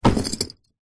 luggage bag leather old metal trim buckle set down on carpet